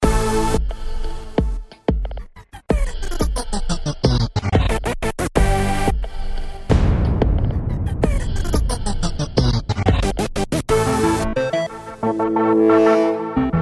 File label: Loop Scratch; Magix